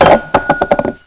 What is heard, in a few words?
drop